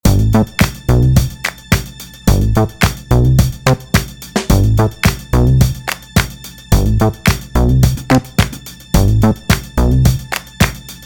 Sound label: disco clap